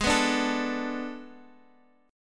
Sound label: A Major 7th Suspended 2nd Suspended 4th